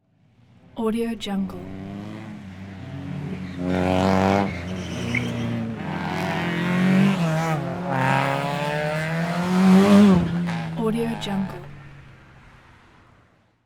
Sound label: race car drives around track revving engine